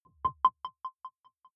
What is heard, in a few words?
button echo distorted